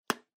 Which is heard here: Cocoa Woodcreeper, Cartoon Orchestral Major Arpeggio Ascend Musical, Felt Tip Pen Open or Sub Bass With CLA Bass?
Felt Tip Pen Open